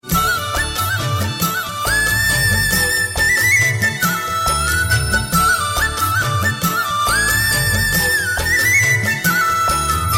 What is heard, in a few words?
flute